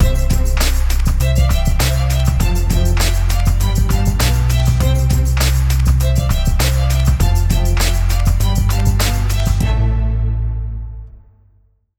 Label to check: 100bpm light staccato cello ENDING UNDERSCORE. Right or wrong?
Right